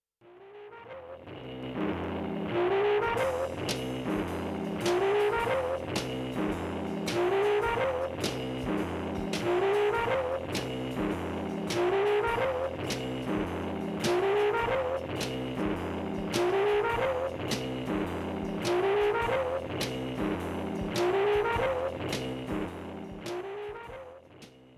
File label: jazz loop